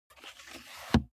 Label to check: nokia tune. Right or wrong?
Wrong